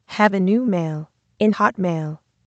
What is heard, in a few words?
new mail; hotmail